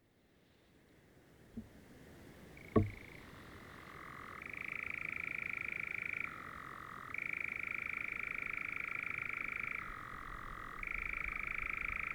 nattskärra; Böda 5 juni 2016; fågelljud

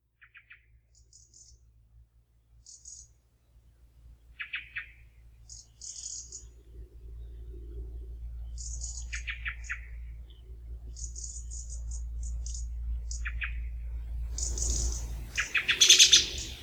koltrast rödhake; Rödhake och koltrast, lockläten; Bråten 30 september 2014; fågelljud

